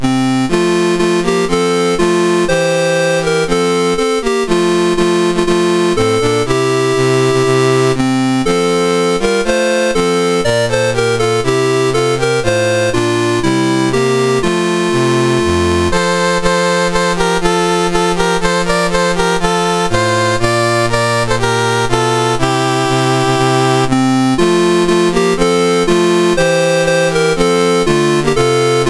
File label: MOS6581R2, National Anthems; Malacca; Dave Marquis